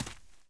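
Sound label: walk right heavy, dirt foot; The Elder Scrolls III - Morrowind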